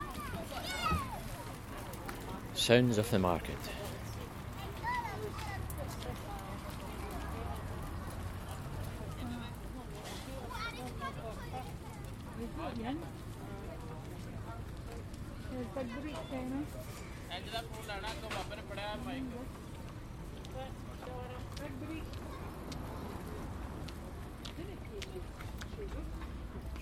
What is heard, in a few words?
sounds from the market place a soundscape